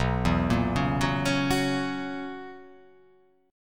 B Major 7th Suspended 2nd Suspended 4th B down, chord shape